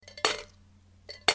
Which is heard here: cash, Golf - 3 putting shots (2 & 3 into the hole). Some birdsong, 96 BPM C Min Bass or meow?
cash